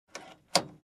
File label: Cabin Crew Drawer Closed